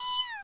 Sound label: Cry Myuu